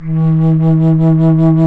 FLT, Bass Flute, BsFlt vib; Roland L-CDX-03 Disk 1

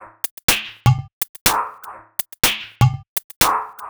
Top 123bpm, House Drumloops; Zero-G House Fabrik Drumloops

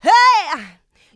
Vocals, Adlibs; Houseworx

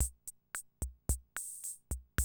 CR78, DrumLoops

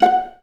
VA PIZZ F#4; Best Service; Peter Siedlaczek's Advanced Orchestra

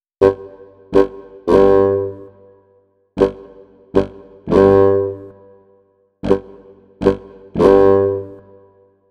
Bass Clarinet, Cinematic Funk; Sonic Foundry (Sony Creative Software); Cinematic Funk Urban Tone Poems

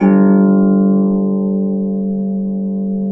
G0 HARP; Complete Harps; USB Soundscan vol.62; AKAI

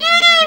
VIO MISC, MISC VIOLINS; Best Service; World Colours

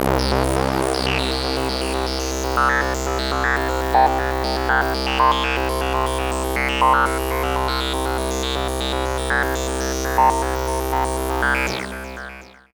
PWM Polymod S+H C 120, Trance Sequences; Trance Formation

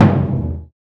PRC LOUD; Roland LCDP14 Africa VOL-2; Afro Menu 1

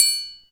DM5 Perc, Alesis DM5 drum machine

